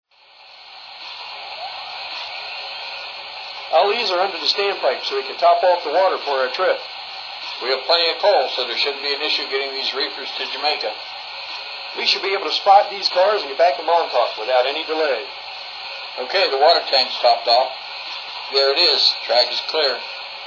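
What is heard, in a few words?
Mikado, locomotive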